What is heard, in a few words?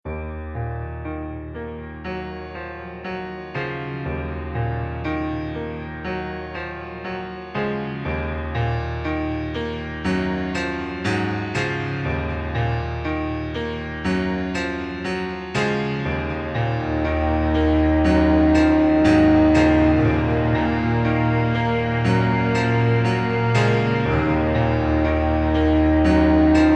Piano Guitar Test